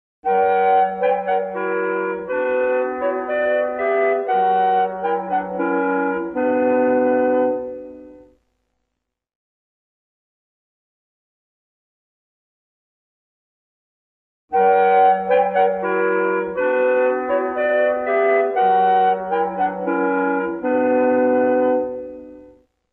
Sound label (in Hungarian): Rádió szünetjel (régi); Kossuth Rádió